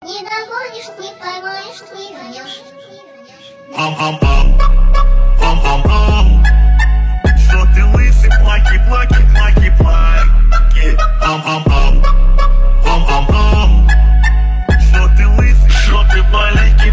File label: slowed remix